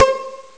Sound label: nylon atmo; Amiga Soundtracker sample